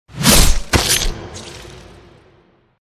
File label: sword hit with blood